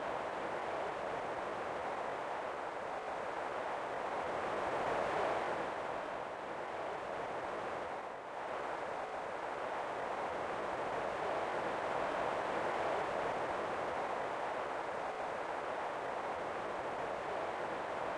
barren, lake

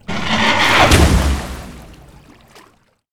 leviathan, monster

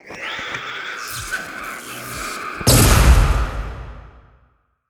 harpy queen death; monster

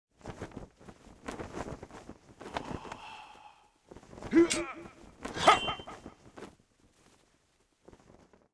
ghost captain idle, monster

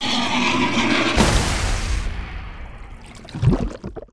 leviathan death; monster

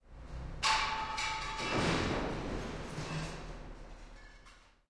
station; ruina

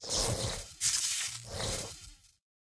mech zard idle, monster

